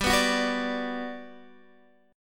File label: G Major 7th, G, down, Guitar, Open D